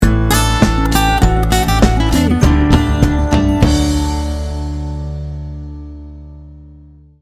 americana sunrise sting bumper

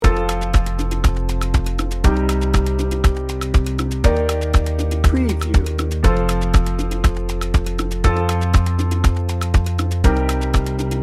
inspiring and hopeful loop

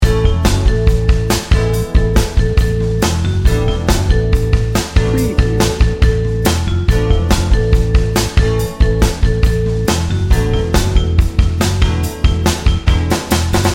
motown metro loop

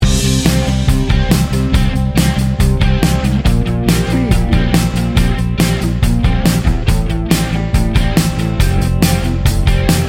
biker ride loop

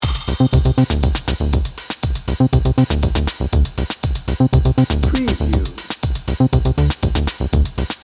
upbeat innovation dnb loop

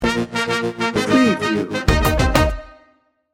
soul electro sting bumper

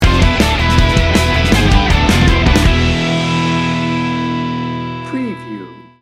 hell rider sting bumper; Fast, powerful, Heavy Metal – Hard Rock. Driving distorted guitars fueled by driving bass

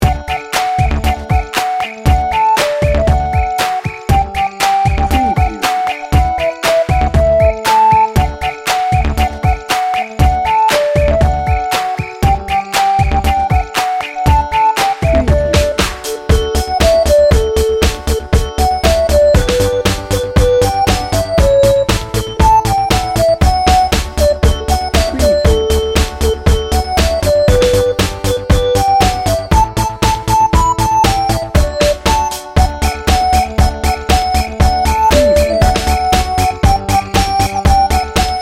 jingle bells

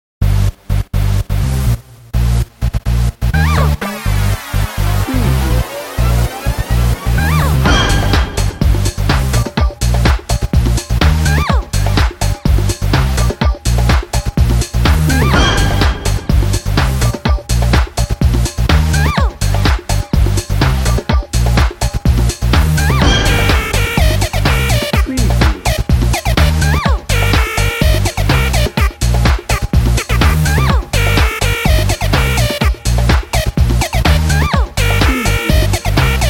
bollywood beat blaster

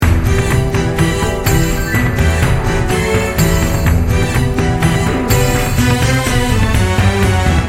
heroic drama action loop

